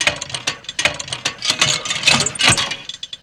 WADA PUMP, MACHINES

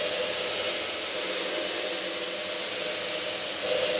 Sample n Hold Verb Loops, sparse soundscape

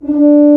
Tuba mf D3; Digital Sound Factory Virtuoso

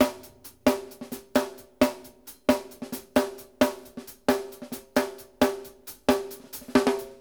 hands D, drumworks

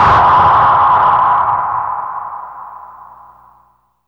echo driver, spacey drums; Spectrasonic Distorted Reality 1

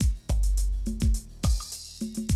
Classic DrumLoops

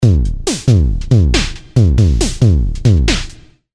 Loop 138bpm, Drums; leloops

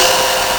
Linndrum, Nintendo Gameboy LSDJ

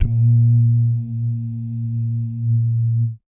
BS DUMM, Vocal Planet Jazz & FX; ILIO; BASS DUMMS

